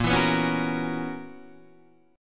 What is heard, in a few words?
B Major 7th Suspended 2nd Suspended 4th A sharp, chord shape